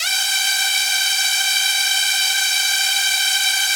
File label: SPACE STRINGS G5, Yamaha VP-1; Music Store